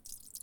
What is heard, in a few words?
splat